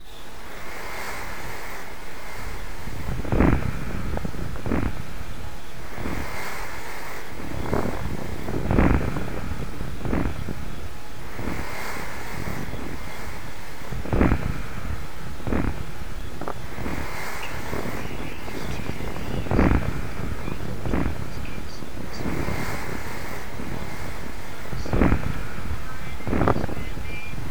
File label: Morning whitenoise